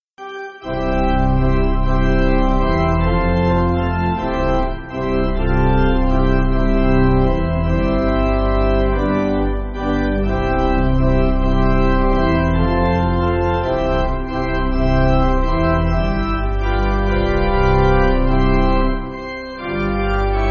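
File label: Pipe, Pipe Organ, Music Backing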